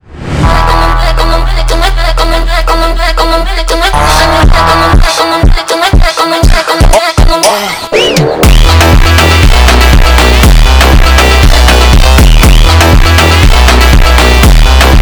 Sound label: FUNK